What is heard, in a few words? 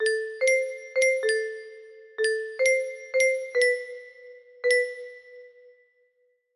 melody